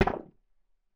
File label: Alien Weapon Shot; Game Essentials; Sound FX Military